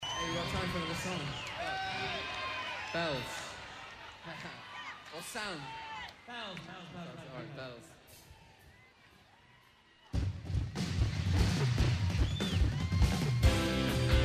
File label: Ring The Bells